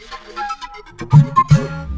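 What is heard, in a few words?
Music Dpad Base 120; from dust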